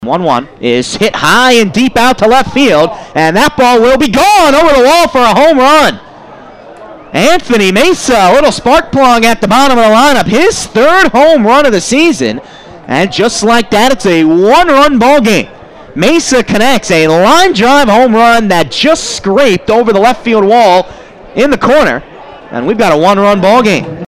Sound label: mesa hr top 7th